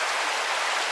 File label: Water Loop; Playable Tutorial Level